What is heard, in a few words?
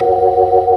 hi fast F#1, ToneWheel Organ; E-MU Formula 4000 Series Vol. 3; Analog Odyssey